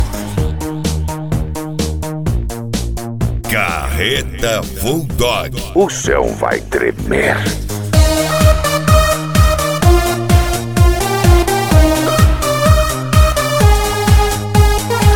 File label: flashback